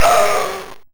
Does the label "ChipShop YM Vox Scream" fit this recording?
Yes